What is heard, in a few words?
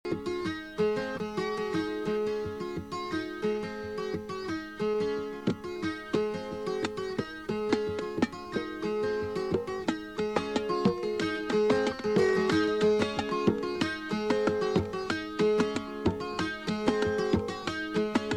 nengon, bongo